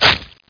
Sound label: RIP